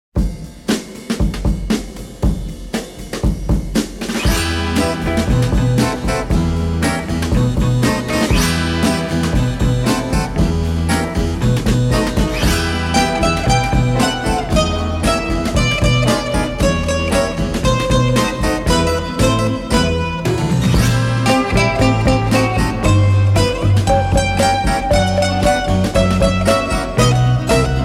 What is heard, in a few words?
guitar workshop